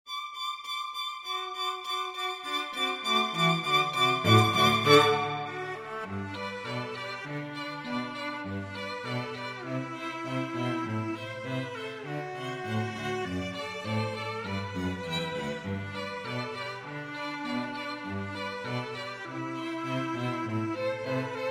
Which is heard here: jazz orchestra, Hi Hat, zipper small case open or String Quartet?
String Quartet